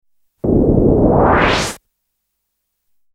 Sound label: Sliding door, medium, no hum; Doors (Sound Effects:Science Fiction); BBC